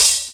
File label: dampened open hat d sharp key